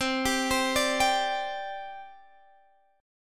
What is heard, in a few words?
C Suspended 2nd C Guitar DADGAD; down; chord shape